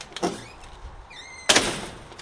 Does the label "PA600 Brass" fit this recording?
No